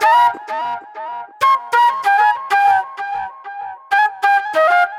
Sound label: NY 70's Delay Flute